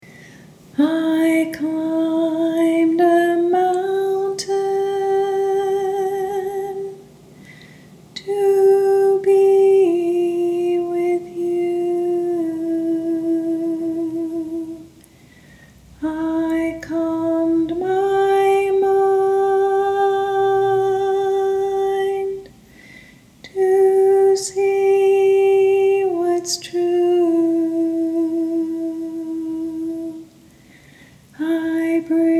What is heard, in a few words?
Learning High Harmony Only